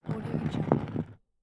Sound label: heavy stone drag; stormwaveaudio